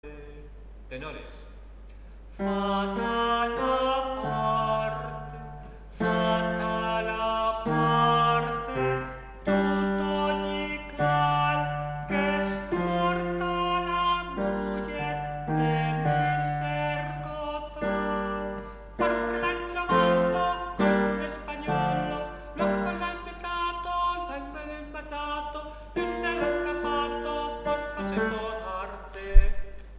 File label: fata la parte tenor; Juan de la Encina